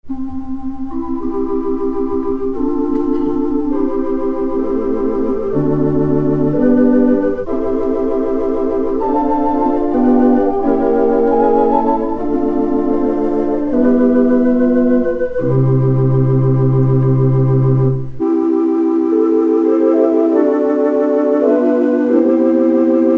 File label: Tibia, orgel